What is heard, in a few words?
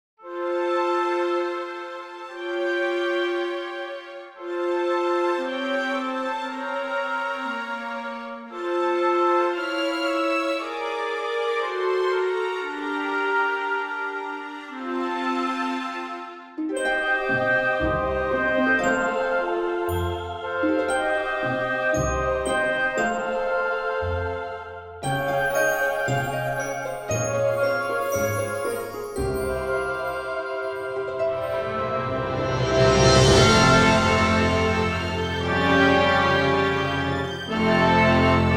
Symphonic Orchestra; Sonatina